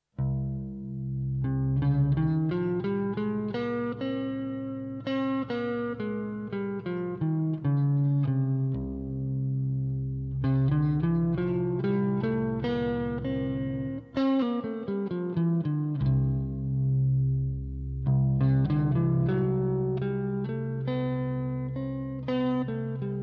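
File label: c phrygian mixolydian lydian; modes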